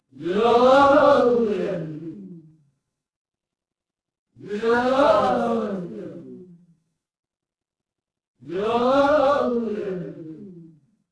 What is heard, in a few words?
crowd gasp